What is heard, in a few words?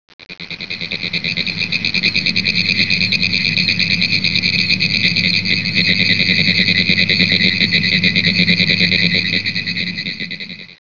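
pinewoods, treefrog; frogs toads; wildlife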